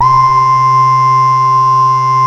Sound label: BEGIN LOOP, Synth and Organ; InVision Interactive; Keith Emerson; The Most Dangerous Synth and Organ